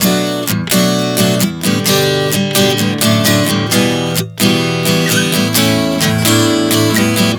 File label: Bm7-G-Em7-A 130 bpm, acoustic guitar chord progressions